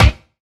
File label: tight lows snare c sharp key; snare drum samples